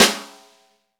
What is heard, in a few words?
SNR, Snare; Club-50 - Foundations Roland; Ext.Snare 3